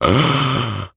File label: Human Male Gasp